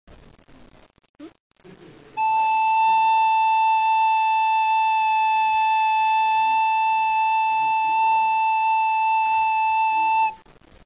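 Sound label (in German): Blockflöte, Tonleiter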